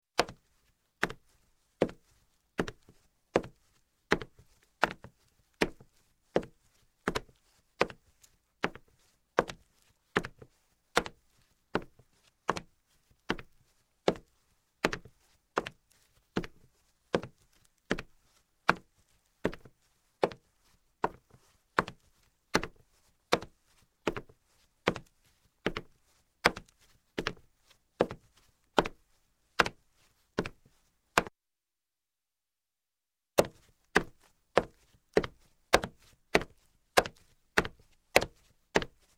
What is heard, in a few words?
Footsteps, Hardwood - Male; Sound Ideas 2000 Series